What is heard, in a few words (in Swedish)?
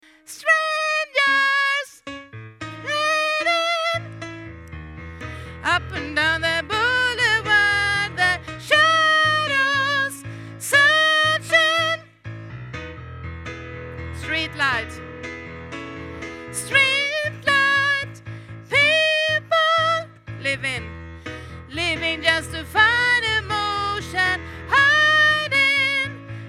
bas